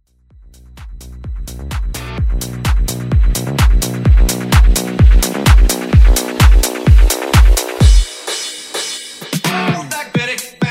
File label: House Vocal